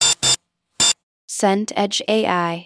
alert critical

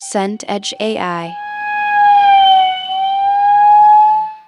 siren police pass

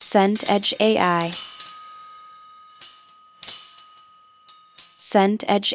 chime wind

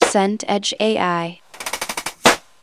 chainsaw cut

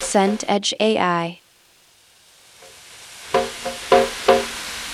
rain on tent

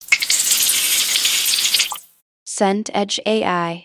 fizz soda

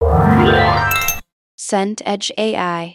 ui level up

